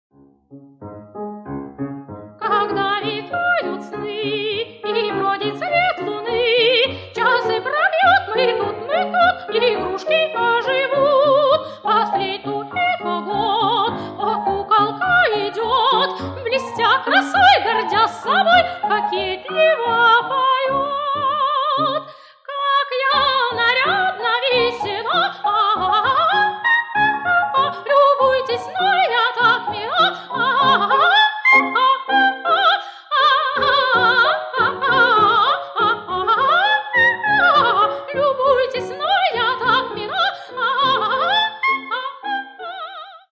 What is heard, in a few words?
vocal miniatures